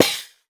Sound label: DEEP GOAT, GOATSKN TAMB; Voice Crystal - Steve Reid Definitive Percussion